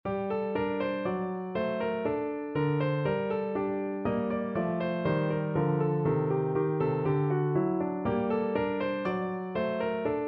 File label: bach gavotte piano; bwv822